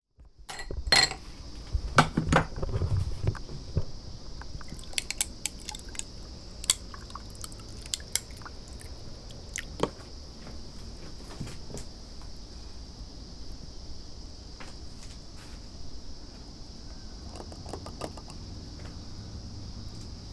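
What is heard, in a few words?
koori no tokeru oto, sound of ice melting; Chino Cultural Complex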